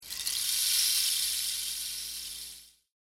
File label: rainstick